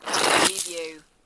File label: Snow and Ice, Ice or snow scrape with debris